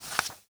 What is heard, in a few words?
page; Sony; picturegear studio; PhotoAlbum